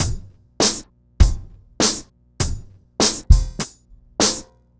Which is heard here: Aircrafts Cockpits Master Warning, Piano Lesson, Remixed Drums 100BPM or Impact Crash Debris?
Remixed Drums 100BPM